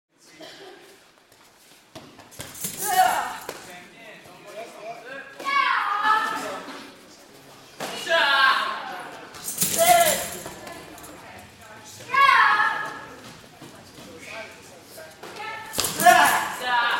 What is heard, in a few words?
Karate Class Ambiance; Hollywood Edge Martial Arts and Human Impacts